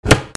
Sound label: DOORS